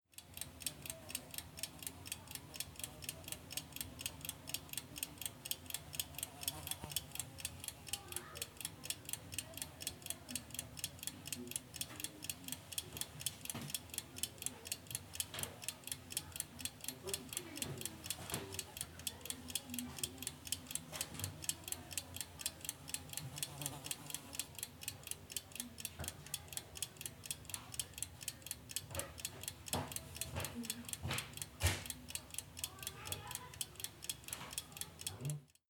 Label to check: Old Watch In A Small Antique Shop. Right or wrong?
Right